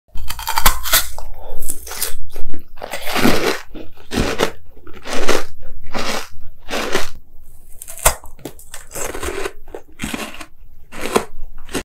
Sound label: Chewing ice